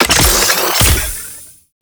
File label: Med Station Activate; Warframe; Lasrian Soldiers; Weapons